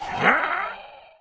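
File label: zombie attack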